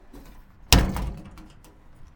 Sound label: shed door close